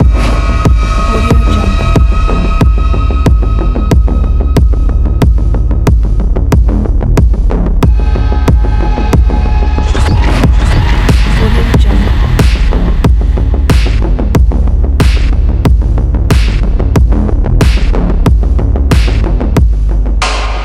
cyberpunk

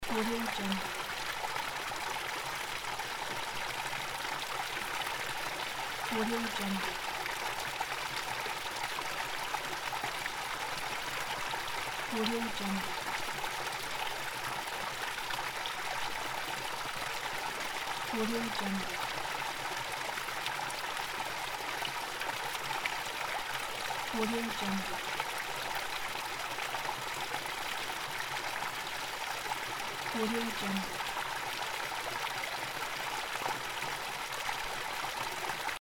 water in a small brook

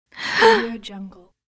female sexy gasp

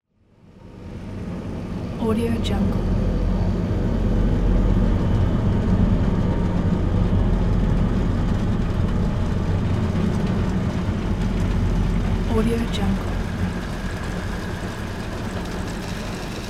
rainy dark ambience

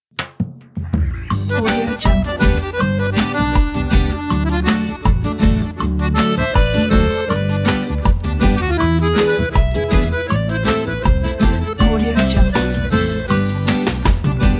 good brazilian xote

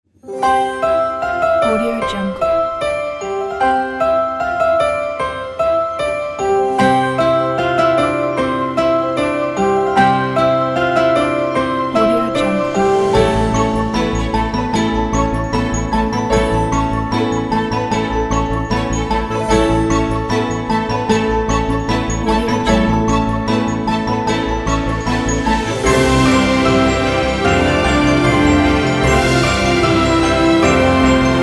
christmas magic

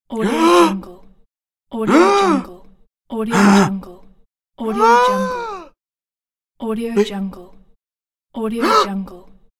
frightened person

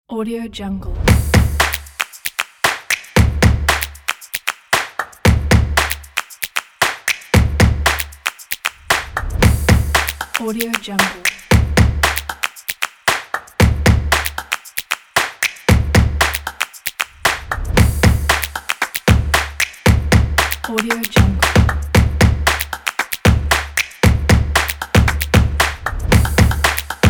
drums in; blueeagleaudio

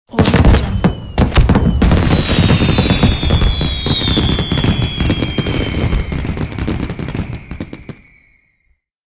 fireworks new year

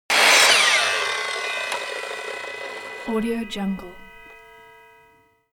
construction machine table saw on load

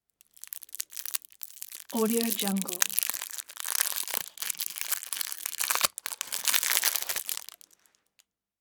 candy wrapper

